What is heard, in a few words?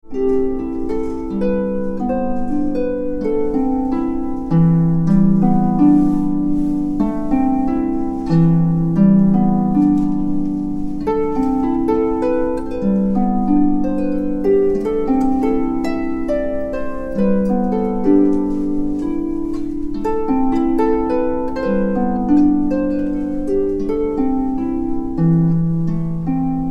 harp; a harpists practice in sound therapy